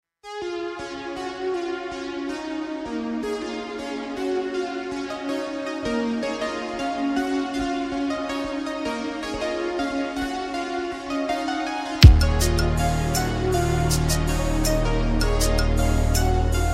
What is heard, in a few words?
Drum Shot Vintage; Kryptic Samples; Ultimate Synthwave & Retro Bundle